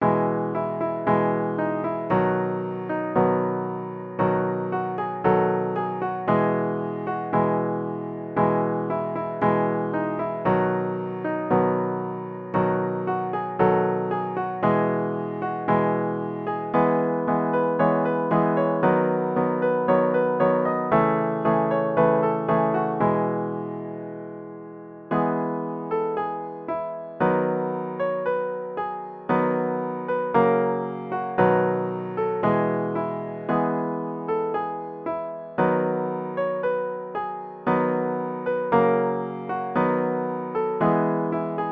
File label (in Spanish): Campanas de Belen Medio, piano; partituras navidad